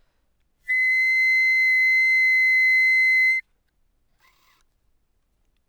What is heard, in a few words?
Bb Clar mf C7, Bb clarinet, woodwinds